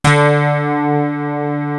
acid piano; East West - Global Sounds; S.I KEYS

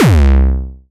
BASS Mechla, bass; M8; Electronisounds

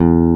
BASSES; Kid Nepro - Elite

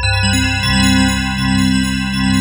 SPARKLES; Sound Gallery - Charged; DIGIT WAVES

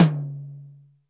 DRY, R8 DRUMS; Crypton - DrumBase5000

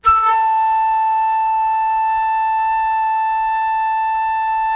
FLUTE FF A5; Greytsounds; Philip Wolfe David Crozier's Saxophones

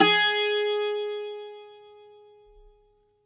bouzouki

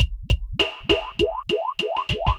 drloop, drumloops, Vinyl Attack; Masterbits

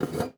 GUITAR FX; Xsample VOL-2

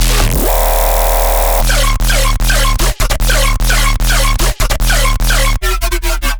bass loop 150 D#; healthylunch; Virtual Riot

